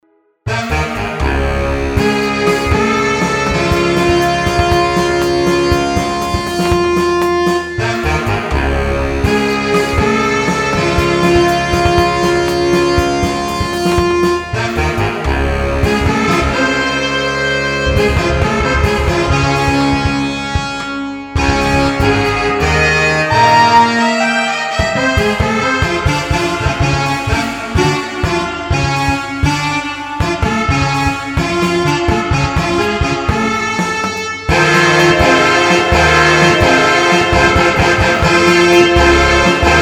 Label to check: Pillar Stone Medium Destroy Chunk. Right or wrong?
Wrong